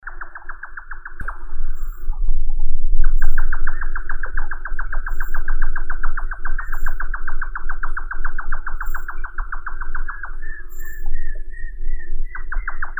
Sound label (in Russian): kozodoi bolshoi caprimulgus indicus